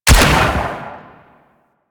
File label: Rail Jack Rifle Shot; Warframe; Tenno